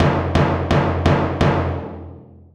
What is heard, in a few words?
drum; grade5; communication